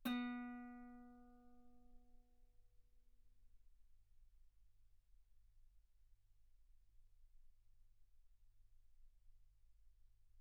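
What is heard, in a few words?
Guitar ff B string B3; sul B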